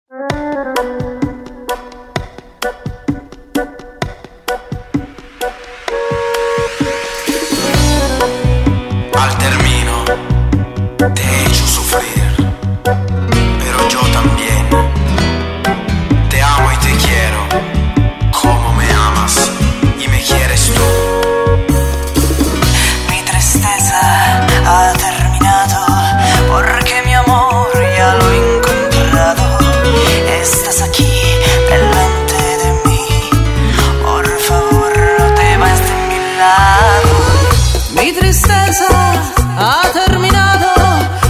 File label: bachata tango